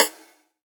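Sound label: house snare drum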